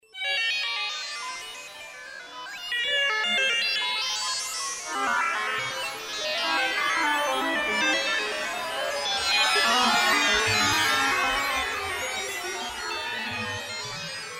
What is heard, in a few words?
Computer Net, Roland SR-JV80-04 Vintage Synth